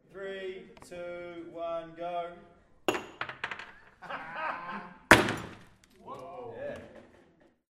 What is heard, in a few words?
reaction to a broken lightbulb